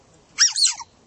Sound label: otter squeaks